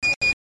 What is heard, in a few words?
Success